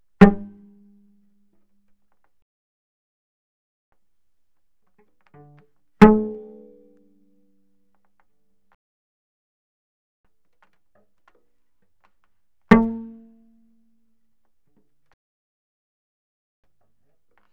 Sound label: Cello pizz ff sul C G3 Db4; Strings